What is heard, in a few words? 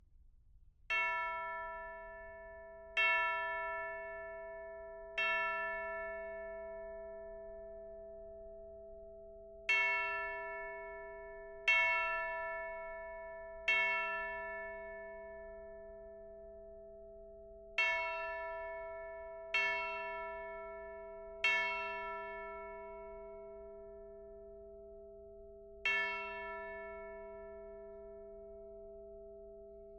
Preludium, Angelus Bells, Carolina Brass